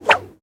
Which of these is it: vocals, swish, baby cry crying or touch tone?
swish